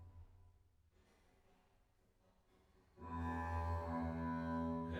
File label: Bass